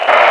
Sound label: Radio Squelch; 1997 MMX LAN PACK (win 95); Army Men